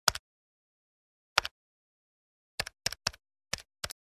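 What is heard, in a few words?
dialing number on push-button phone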